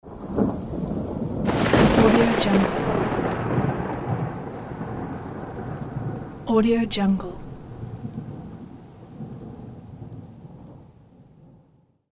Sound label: thunder